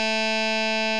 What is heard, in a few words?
KS string one second